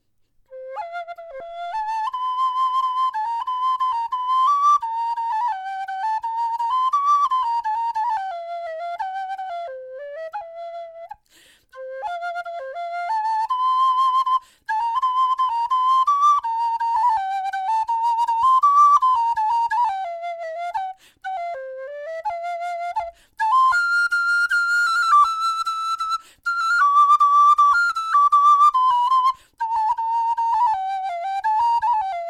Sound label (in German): blockfloeten sopran melodie; meinl